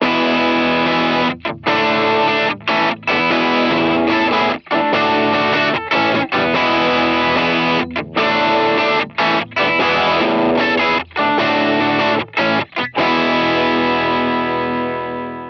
Guitar; Weedwacker; Light n Bright; H90

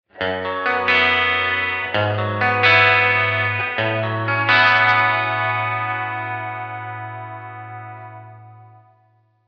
Chorus; Grail

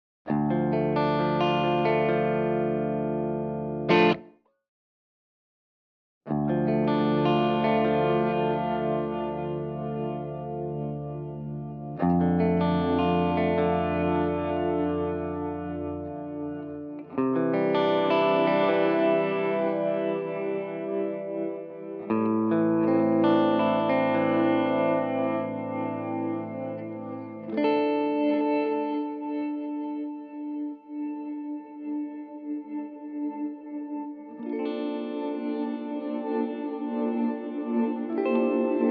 TimeLine MX Grain Armonica, strymon timeline mx

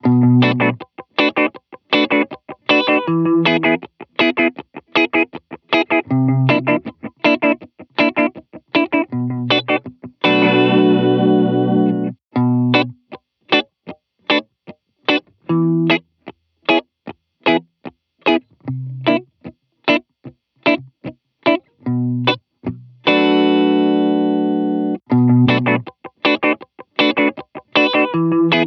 Guitar Delay Reggae; H90

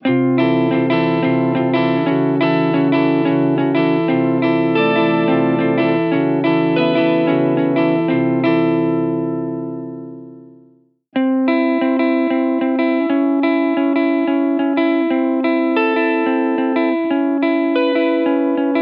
Guitar Polyphony; Hesitate; H90

